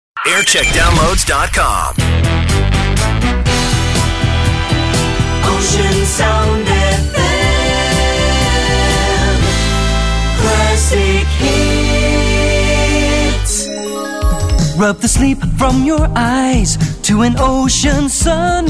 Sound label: Jingle Montage; Ocean Sound; TM Century